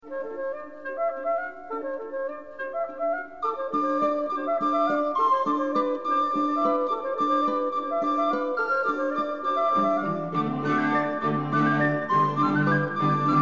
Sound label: HandBell sound track; Finale